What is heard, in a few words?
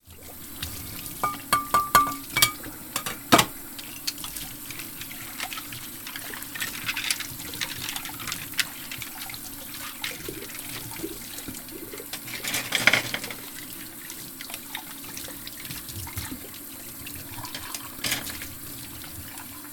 washing dishes in kitchen